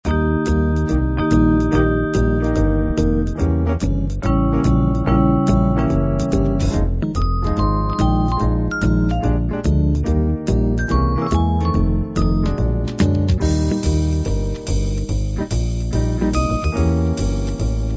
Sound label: soft jazz